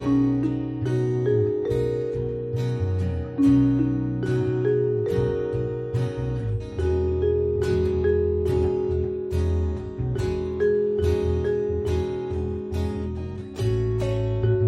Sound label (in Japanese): kokkenn